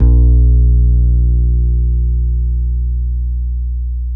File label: HOLLOW FRTLS C 3, Bass; Spectrasonics; Bass Legends